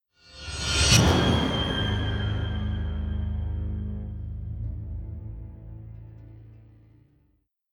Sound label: Stinger Drama; Dishonored